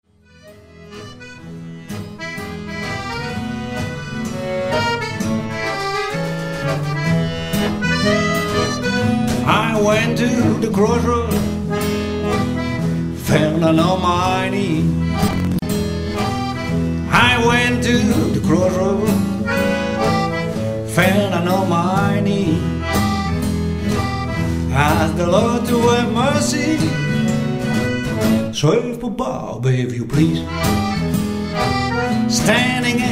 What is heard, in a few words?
blues